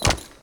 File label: trot, horses; CosmicRage